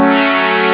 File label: jump; ST-XX Amiga Soundtracker Sample Packs